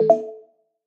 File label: chat alert